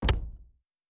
UI Click; Fantasy Interface; Fantasy Sounds Bundle

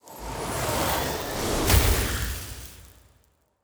Ice Spell, Magic Sounds; Fantasy Sounds Bundle

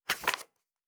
Book Page; Fantasy Sounds Bundle

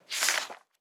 Book Page; Fantasy Sounds Bundle

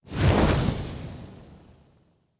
Fire Spell, Magic Sounds; Fantasy Sounds Bundle

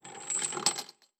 Chain; Fantasy Sounds Bundle; Craft

